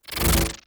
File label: Break Mono Jolt